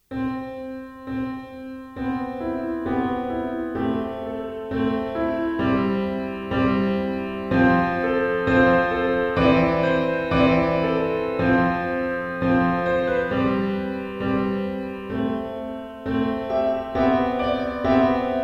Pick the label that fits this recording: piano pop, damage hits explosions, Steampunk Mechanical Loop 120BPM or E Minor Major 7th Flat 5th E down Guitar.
piano pop